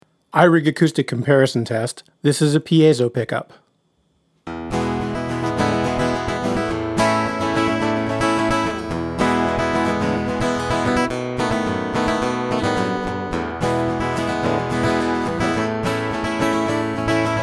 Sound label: iRig Acoustic Comparison Strumming